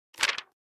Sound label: Softcover book page turn